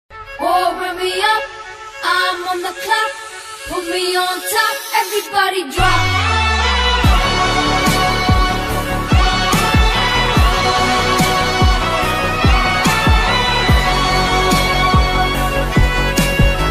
theme music; kalki; prabhas; nag ashwin

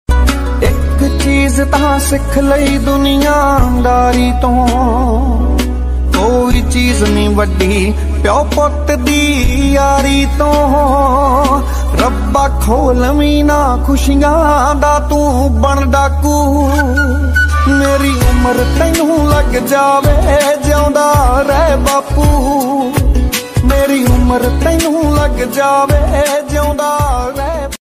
Punjabi Song; father ringtone

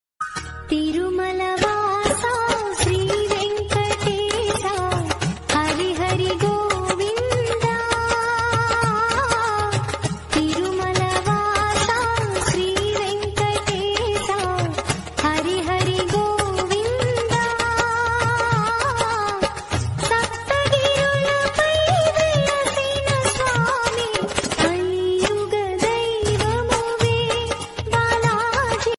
song ringtone devotional song ringtone

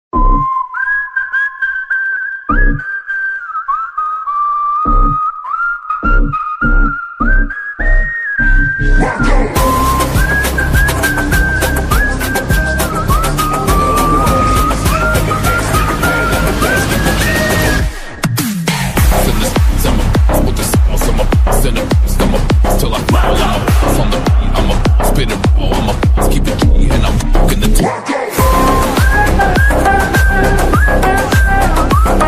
attitude bgm malayalam bgm; marco movie; unni mukundan

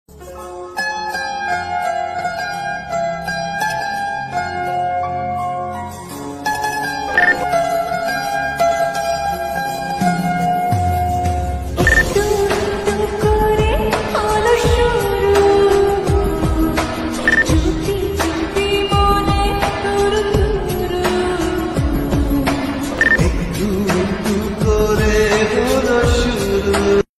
bengali ringtone

